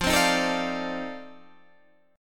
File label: F 13th F, chord